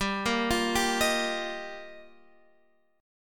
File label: G Minor 7th Sharp 5th, Guitar, Drop D; chordshape; down